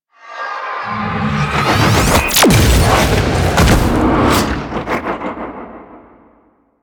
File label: Dash Grab, Forest Night End; Umbra; Operator; Warframe; Sacrifice